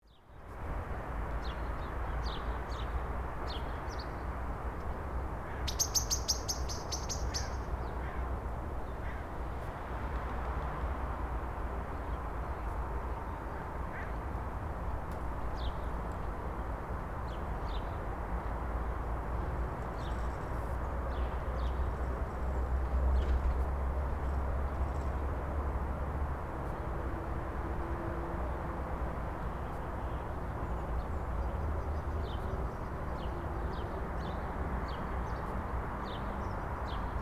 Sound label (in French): nature bord route jardins vides